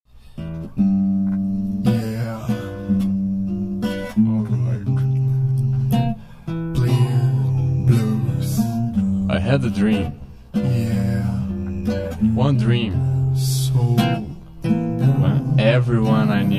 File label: blues